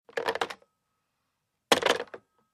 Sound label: Tel Hang Up Rotary